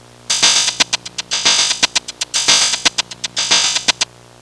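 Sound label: vibrating; Sound Library 2000; Wayzata Technology; Music In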